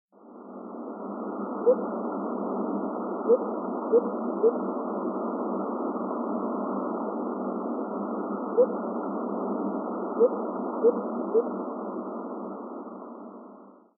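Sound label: Lesser Coucal; banken; itoman; koe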